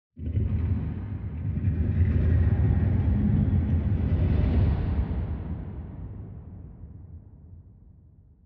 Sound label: ambience arena stone